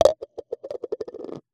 Cup Wobbling, glass, abrupt attack, wide wobble, long release, extra hit; Eiravaein Works; Vaeyan I